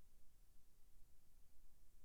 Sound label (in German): beide Mikros eine Spur, Mikrofonrauschen; Tascam MK-144 II; Rode NT3